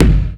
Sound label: thumpy kick F# key; kick drum samples